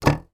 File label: musical keyboard key flick spring up; sound effects one